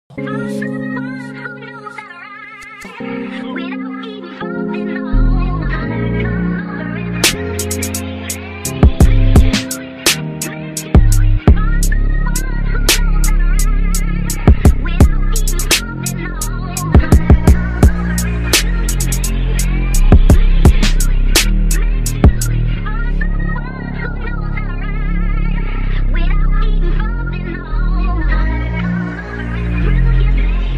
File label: Instrumental